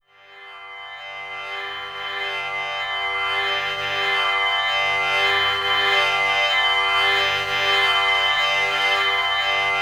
TAMP DRN G2; Spectrasonics; Heart of Asia

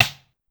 Rim, Clavia DDrum AT, Pitched Down

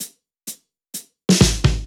Fills 128BPM, Clubsounds; Vengeance - Essential Clubsounds Vol.5

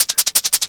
Shake 170, Drum&Bass Perc Loops; EZRollers

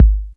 808 KICK; AKAI S6000 CD-ROM - Volume 4; Kick 2

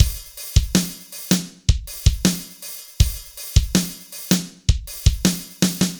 BPM 80 AMB

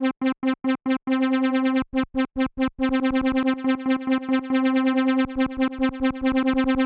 Arp 140bpm C, Trance

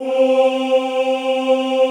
OOH C3, Classical Choir; Best Service; Extended Classical Choir

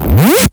Scratching FX

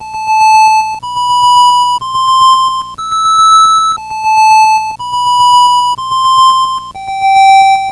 Atari 2600 Synth; 8-Bit; Chiptune

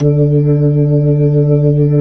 B-3, Jazz

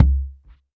WD R, DRM HITS; Spectrasonics; Liquid Grooves

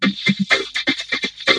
VOCO LOOP; ELEC-LOOPS; Syntec; Wall of Sounds; TECHNO-BOX

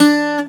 C GTR SOFT D3; USB Soundscan vol.42; AKAI

